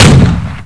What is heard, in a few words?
HIT; NIHILIST